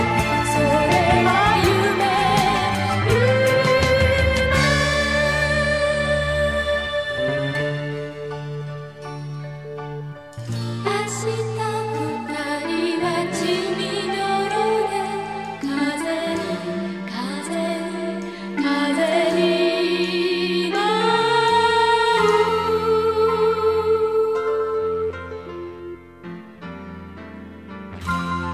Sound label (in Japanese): oyasumi midi mix